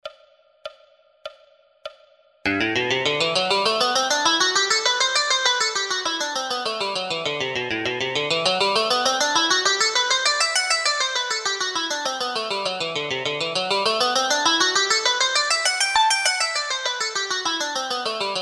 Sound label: scales 3 notes per string loop